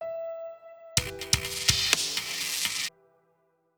quest failed; Escape from Tarkov

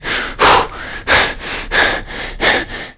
player climb breaths; Call of Duty Modern Warfare

